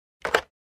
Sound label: Telephone receiver is picked up, hung up